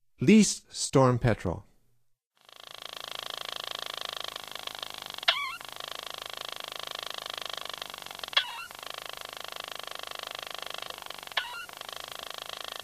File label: Least Storm Petrel; North America; Stokes